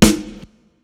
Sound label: Snare